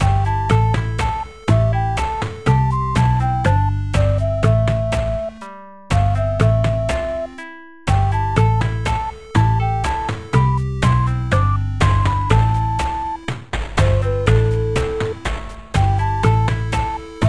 Stage Loop; Ravva and the Phantom Library; Dragon Cemetery

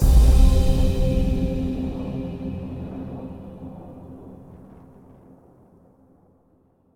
Combo C

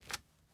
masonry jump

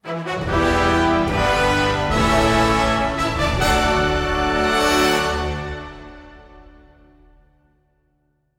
Level Up Stinger

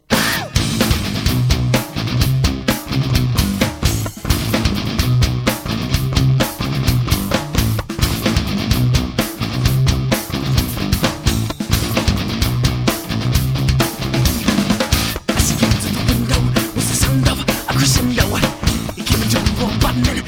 Party Music; Street Cricket Champions; PSP

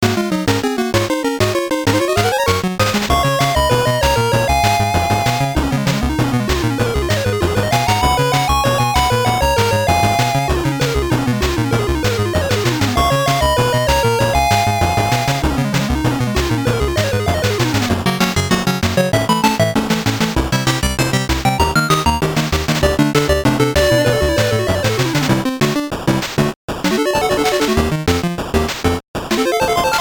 BGM, WonderSwan Color; Digimon Tamers Battle Spirit

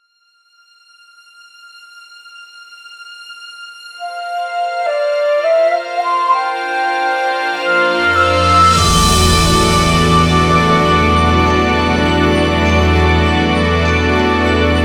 Curse Break; Fae Tactics; Xbox Series X/S

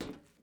metal step

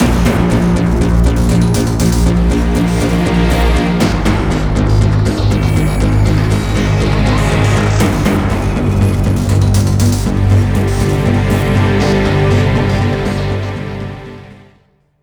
bgm game over; Armed Emeth

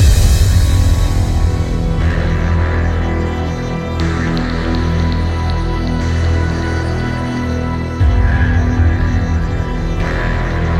Ominous loop; OuterFields

